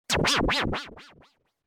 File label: stupid